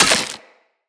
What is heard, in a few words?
Melee Impact Glass, Weapons